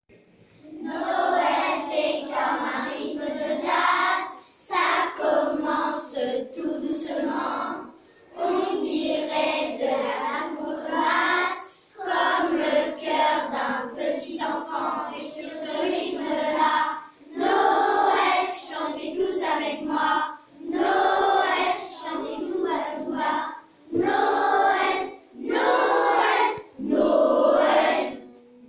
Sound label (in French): noel jazz